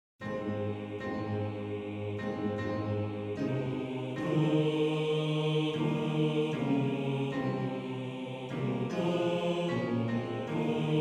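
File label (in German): Bass 2, Männerchor; Wohin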